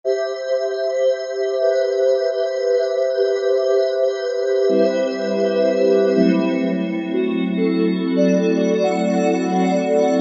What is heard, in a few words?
Choriana, Korg T3